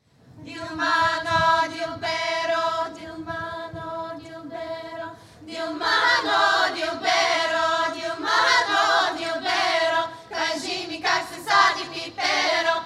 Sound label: Bulgarian Womens Choir; Dilmano Dilbero; Live at the 2014 Golden Festival; WFMU